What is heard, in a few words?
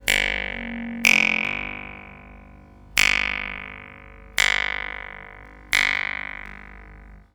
snapping fingers